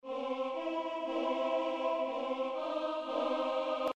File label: Audio Major Minor 3rd